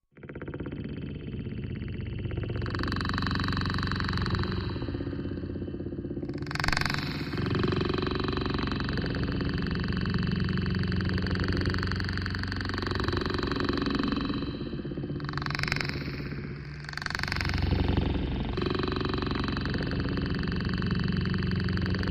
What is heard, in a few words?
Taboo Hunter, Machine, Vibration, Wooden Shake, Grind